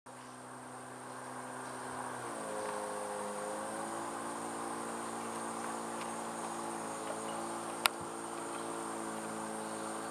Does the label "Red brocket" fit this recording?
Yes